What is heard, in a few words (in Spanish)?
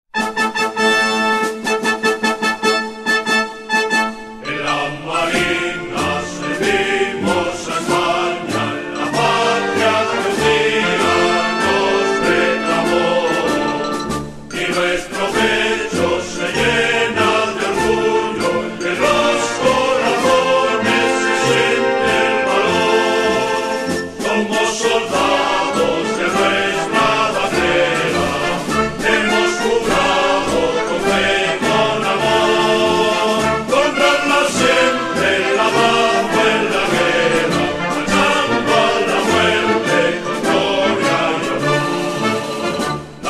Himno; AGRUMAD